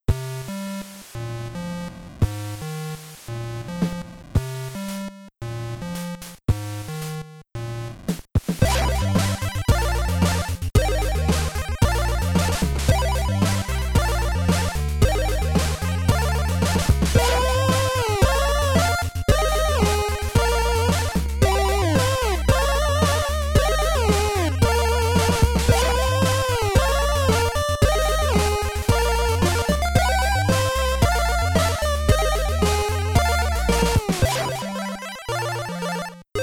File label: arps